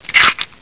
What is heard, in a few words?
eggs crack; ourano